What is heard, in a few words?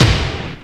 hit, percussion hit